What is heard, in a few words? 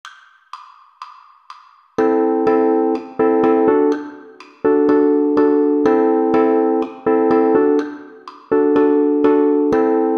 Strum, uke